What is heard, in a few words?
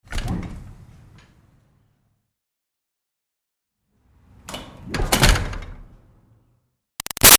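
Door Metal Retail Push Interior Open-Close; Metal Doors; Big Room Sound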